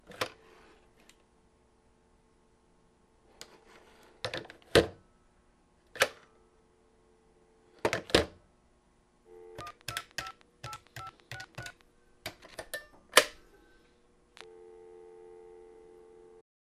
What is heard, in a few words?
90s Chorded Phone, Household